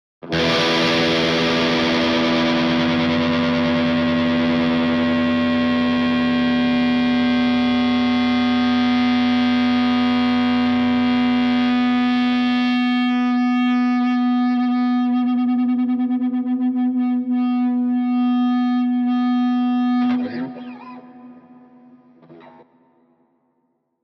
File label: Elect Guitar, electric guitar